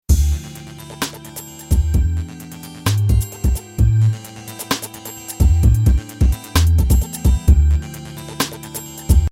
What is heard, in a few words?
Roland MC909 rb